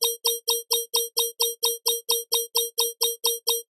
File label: science fiction alarm warning beeping med fast